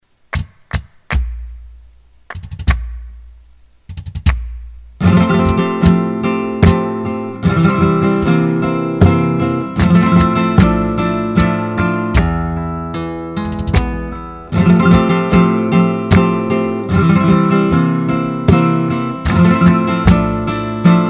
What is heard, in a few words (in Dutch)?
Soleares compas met variatie in akkoorden en rasgueados